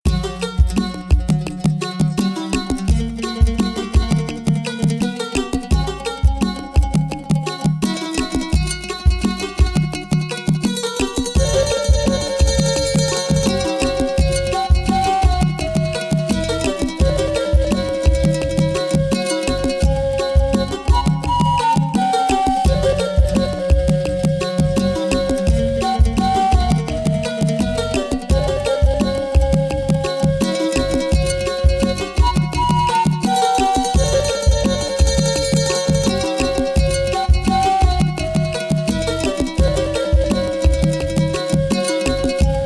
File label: sensual rhythm indian dancing 432 hz; Indyjski taniec